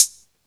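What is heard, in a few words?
Closed Hi-Hat, Dance Drums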